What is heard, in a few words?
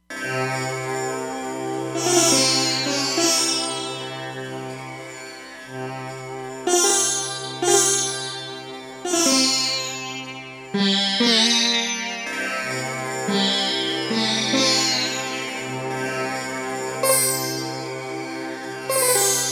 East Indian, E-mu Proteus-3; Factory patches